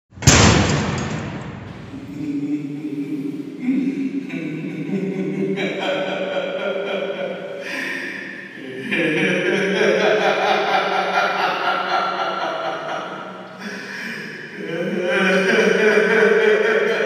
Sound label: asylum; سه بعدی تیمارستان